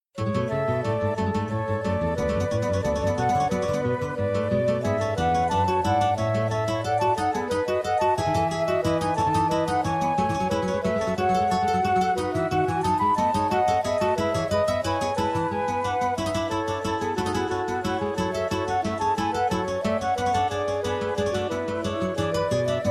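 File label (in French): inventions pour guitares flûtes; bach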